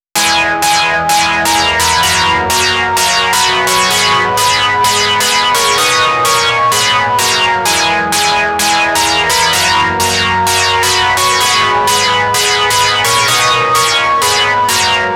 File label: Pad Synth, 128 BPM; Vengeance Samples; Vocal-Hook Kits; Song Kit